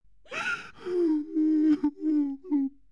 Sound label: Cry, creatures organic; Burno